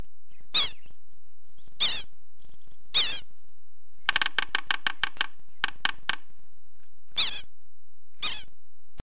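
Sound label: sapsucker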